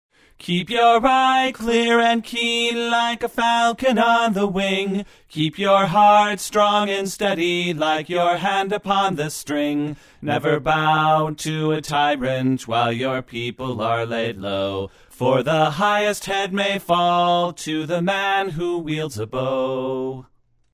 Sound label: William Tell Tenor Harmony